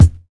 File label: old school thumpy bass drum sound F key